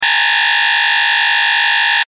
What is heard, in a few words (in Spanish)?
tono